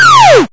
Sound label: lose; Kenney's Sound Pack; Retro Sounds 1